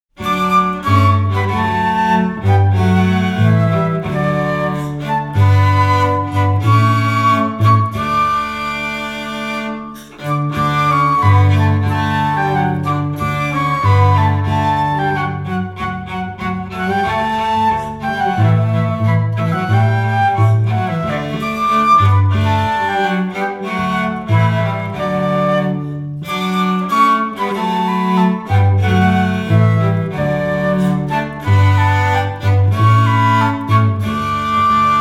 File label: instrumental; Joie sur la Terre